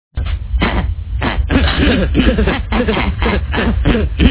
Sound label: hehe; beavisandbutthead